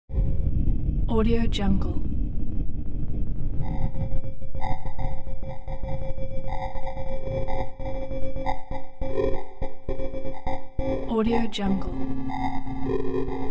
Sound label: damaged futuristic transmission hum